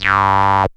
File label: SYN TON, ARP SYNTH; E-Lab; X Static Goldmine 2; akai